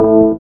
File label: SYN DANCE; Sound & Vision - Gigapack II